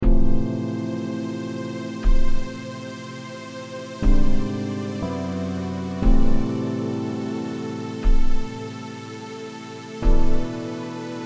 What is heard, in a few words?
Am 120 Soft Synth Groove